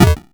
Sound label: Crushed Perc, bitcrushed; musicradar